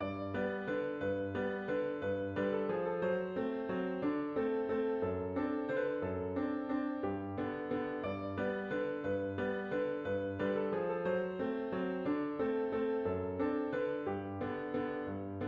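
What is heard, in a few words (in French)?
pour ceux qui debutent au piano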